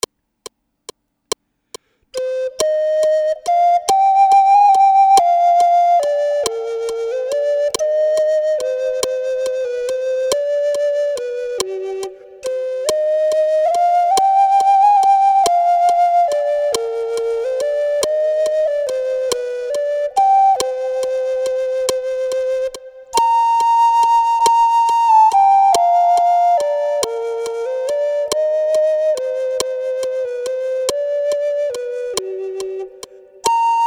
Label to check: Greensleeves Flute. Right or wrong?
Right